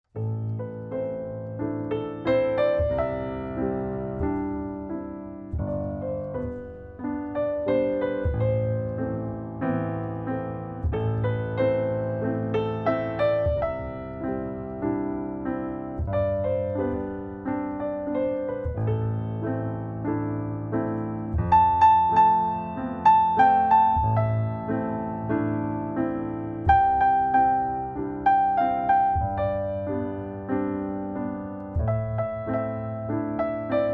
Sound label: Song Piano